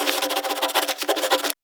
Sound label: Writing